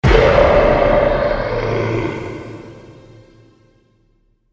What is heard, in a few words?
Trailer Hit Monster; Trailer Sound Effects